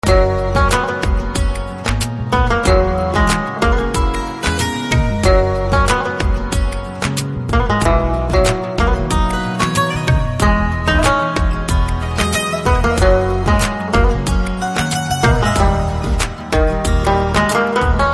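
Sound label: background music Telugu Ringtone; Amavas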